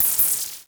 REZ NOIZ, Klone Dual-Percussion-Synthesiser; KLONE NOIZ NW8

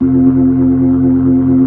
B-3, Keyboards

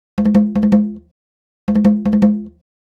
Conga, Latin Percussion

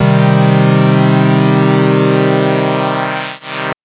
Synth SidPulse min c2; TrashLibrary

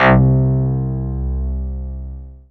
BUZ, synthesizer bass; VFX-SD

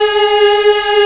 G#3 child EH L, Choir; USB Soundscan vol.28

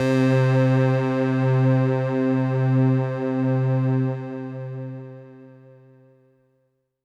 Synth C, Synth Prophet One Shots; Electronisounds

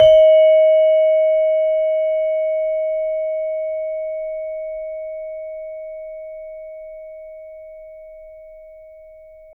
SARON D#2; Spectrasonics; Heart of Asia

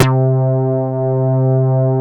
MOOG PRO, BASSES; Masterbits - Vintage Voltage; VV BASSES

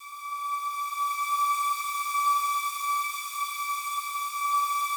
slow strings D5, Yamaha VP-1; 90 s Sample CDs; Music Store

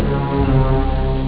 step string; Amiga Soundtracker Sample Packs

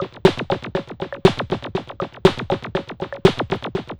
Percussive

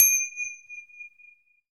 percussion bells

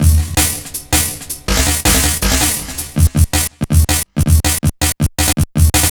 Stretcher 162, Drum Loops, Jungle; Jungle Warfare 1&2

